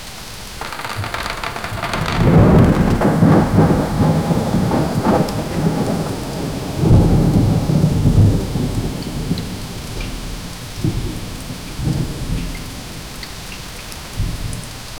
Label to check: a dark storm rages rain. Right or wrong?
Right